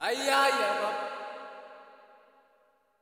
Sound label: SOLO G#, Heart of Africa; Spectrasonics; Heart of Africa 2